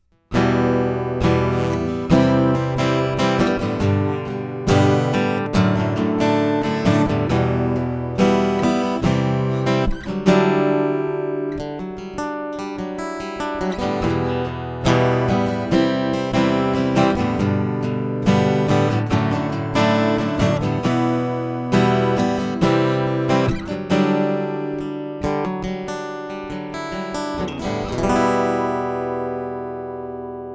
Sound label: Fishman Aura Spectrum Strumming Ibanez AW54 Bypass